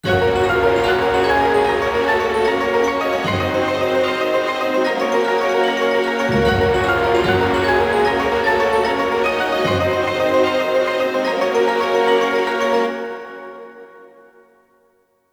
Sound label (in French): Jingle Victoire